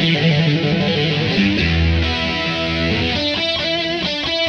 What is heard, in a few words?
E5 134 rhythm; Loops